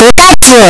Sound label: pikachu cry; pokeyellow